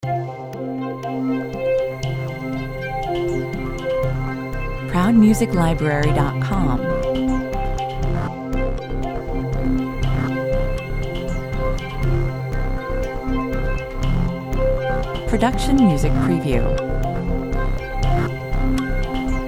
steam rhythm 120 bpm, Light and magical, this soundscape bounces around with slightly ethereal feel.